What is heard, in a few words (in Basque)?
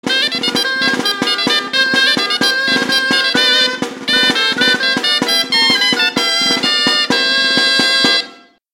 Gaiteroak; Cortesías; Fustiñanako Gaiteroak